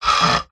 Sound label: industrial lever metal large heavy short fast movement creak; zapsplat